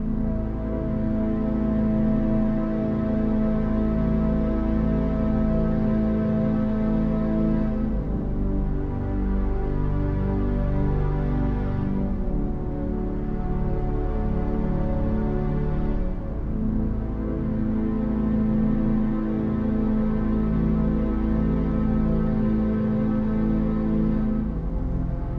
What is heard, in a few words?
Village Sad Loop; skykeepers; ps4 windows xbox one gamerip 2017